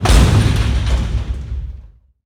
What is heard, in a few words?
Door Large Metal Stop; SFX Kit; Doors